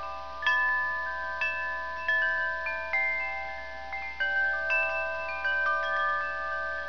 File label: windchime